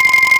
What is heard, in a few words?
BAMB TREM C5; Big Fish Audio; Synth City